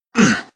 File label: light pain; zero